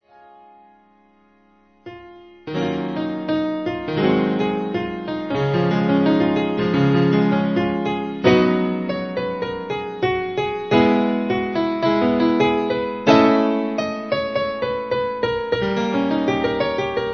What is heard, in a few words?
piano